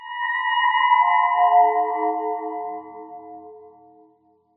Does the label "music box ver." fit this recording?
No